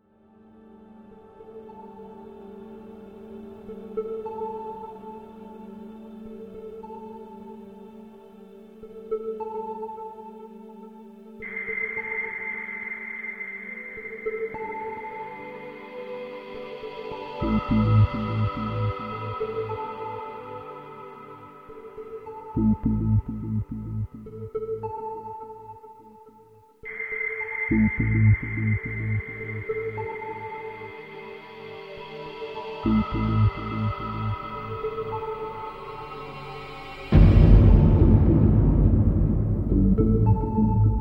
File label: Pilot Menu Ambient; windows